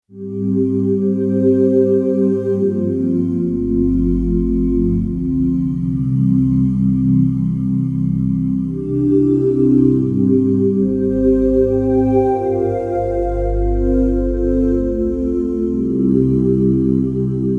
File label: Add a Pad